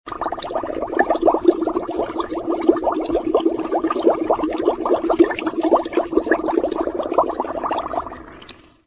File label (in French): Eau ebulition; bruitage; Liquides